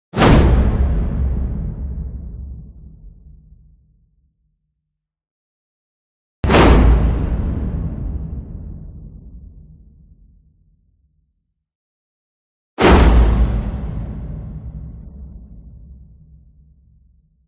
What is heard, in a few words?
CINEMATIC IMPACT Hit Wood; smartsound